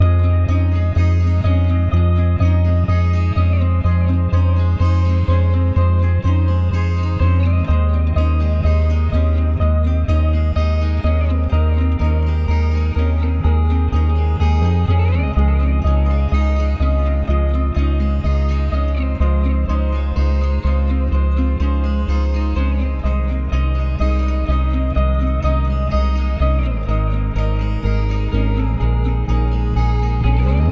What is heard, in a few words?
125 BPM G# Maj, Guitar Loops; Last Time; Cymatics; Journey Guitar Loops